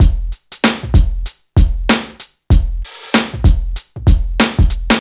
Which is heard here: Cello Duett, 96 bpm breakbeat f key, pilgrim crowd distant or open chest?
96 bpm breakbeat f key